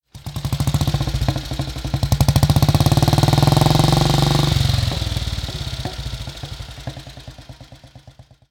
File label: BSA Sloper S28